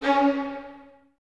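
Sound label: Violins C#4 staccato right; Miroslav Vitous; String Ensembles; 11 Violins; 11 VS Stacc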